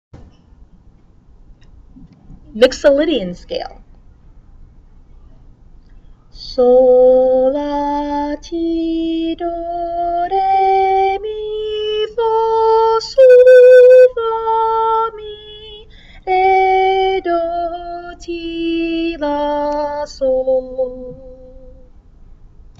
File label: mixolydian scale